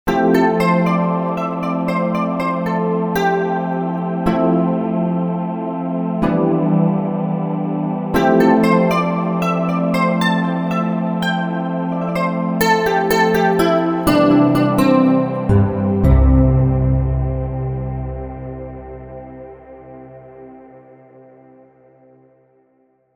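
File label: Vintage Layers; Roland SRX-07 Ultimate Keys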